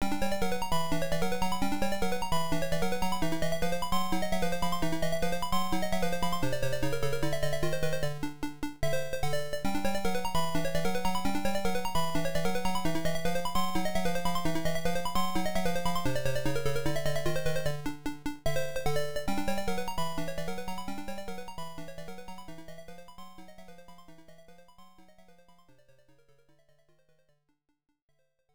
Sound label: Power-up; The Fairyland Story msx